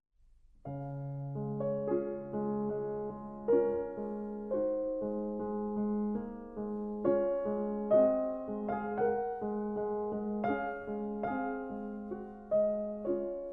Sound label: Préludes, Op. 28 No. 15, Sostenuto In D-Flat Major; Chopin; Raindrops